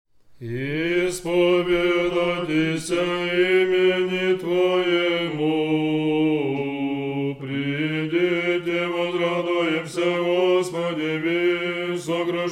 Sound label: tone 3; tone 7; sunday